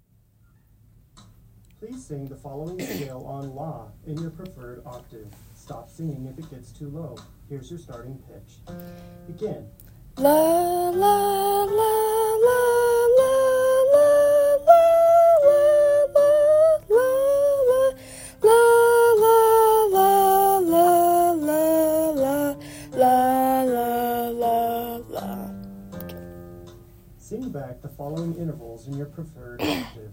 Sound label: alto; Audition